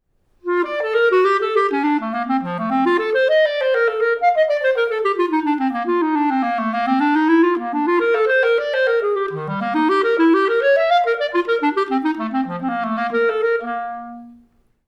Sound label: Metal Clarinet